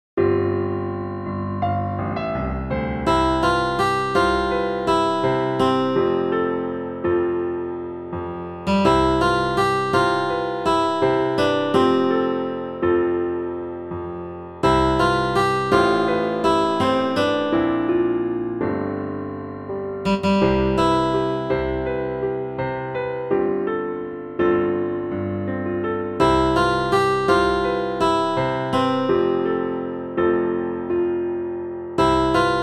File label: accomp melody